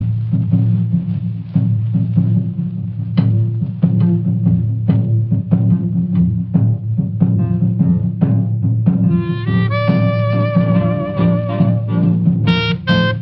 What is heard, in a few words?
Jungle Drums